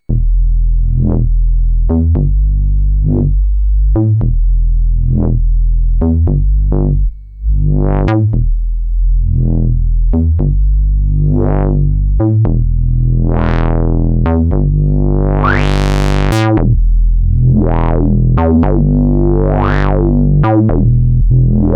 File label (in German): monologue sequenz